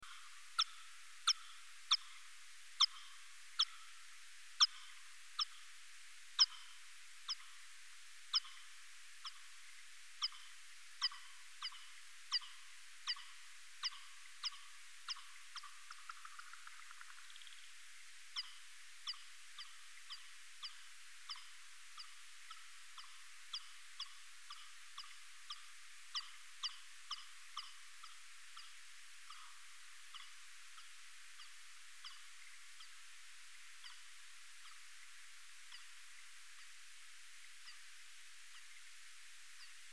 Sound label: buzz